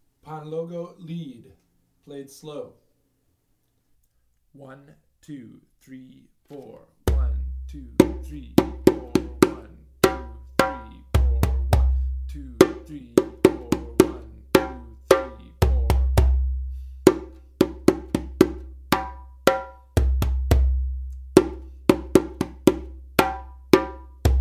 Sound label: Kpanlogo Lead Sample